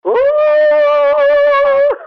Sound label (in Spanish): alarma boca